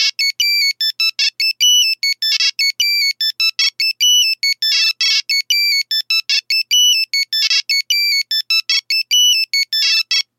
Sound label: Nokia 3310 ringtone; Nokia Ringtones; Nokia Design Team SoundCloud